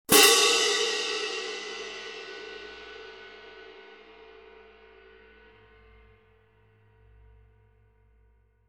K Constantinople Vintage Orchestral Medium Heavy Pair, cymbal